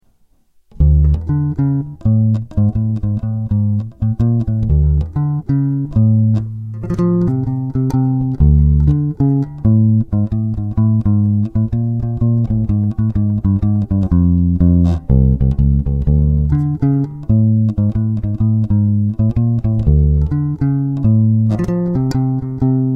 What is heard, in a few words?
bass sound sample 50 bass 75 mid; weigraff